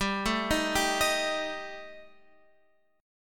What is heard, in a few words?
G Suspended 2nd Sharp 5th